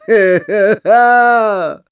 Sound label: Crazed Laugh; Ghirahim; Skyward Sword